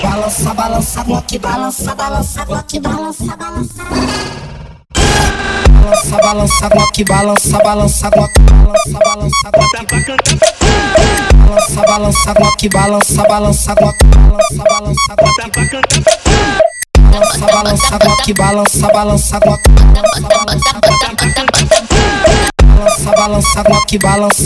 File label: brazilian funk music